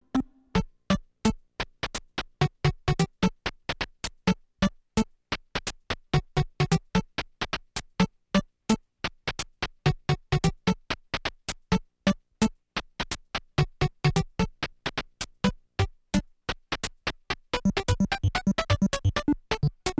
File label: Item Screen; Famicom Jump Hero Retsuden; Bandai